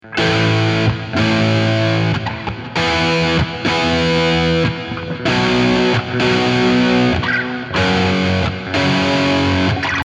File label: waves